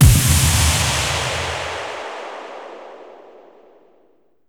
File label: JINGLE BOSS ENCOUNT; maimai